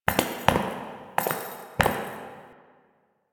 Idle Backward Steps; Ambience; Warframe; Entrati; Tagfer

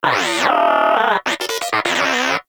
Moa Inquisitive Vocal Disagree; Pets; Warframe

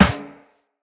Run Metal Perforated, footsteps; Characters; Operator

